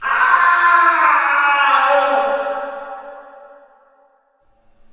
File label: prison pain scream; Quake 4 Alpha; id software